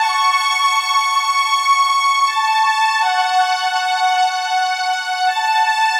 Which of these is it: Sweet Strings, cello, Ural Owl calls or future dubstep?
Sweet Strings